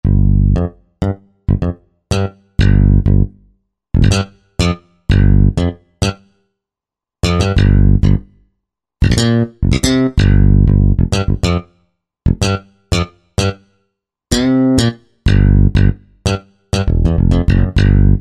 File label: Dyna Slap Bass; Korg TR-Rack